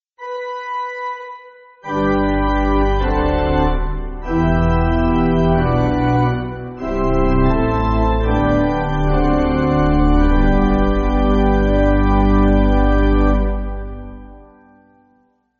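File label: Three Fold Amen, Pipe Organ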